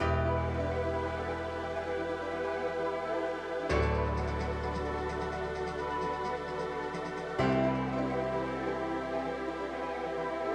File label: Ethereal viby